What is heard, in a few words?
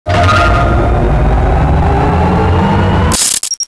gauss reload, weapons